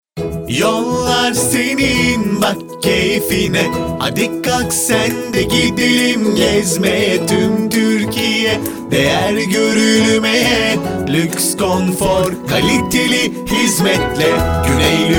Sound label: jingle